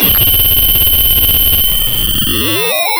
pop, Sound of a cat meowing

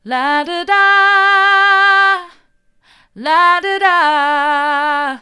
la do da mid, vocal

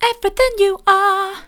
everything you are soft, vocal

